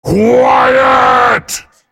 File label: spell cast effort, en US